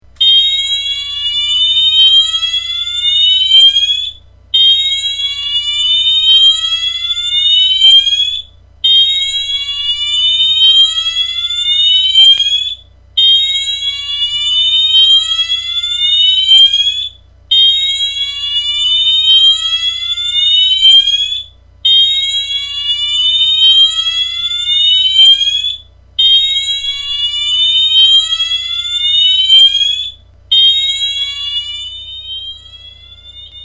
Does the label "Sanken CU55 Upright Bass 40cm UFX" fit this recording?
No